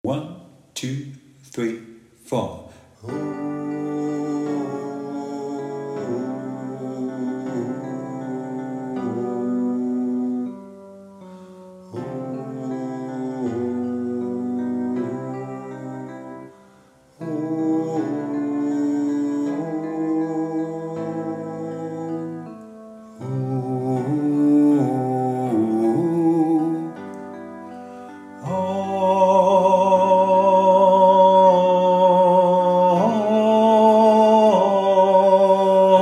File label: Sopran; Popchor